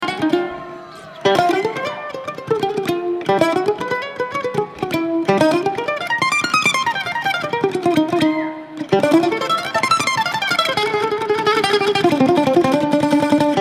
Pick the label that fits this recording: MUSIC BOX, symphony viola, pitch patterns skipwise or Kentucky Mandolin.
Kentucky Mandolin